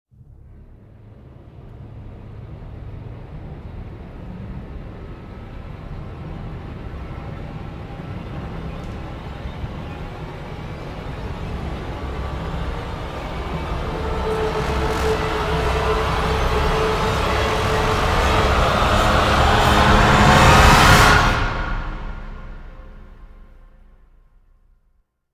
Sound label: Orch & Choir Rise